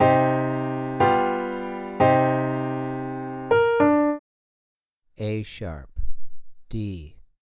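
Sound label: 2 Note Melodic Minor a#3 d3, piano; ear training; melodic ear training for musicians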